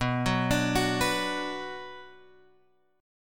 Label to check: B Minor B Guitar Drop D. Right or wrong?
Right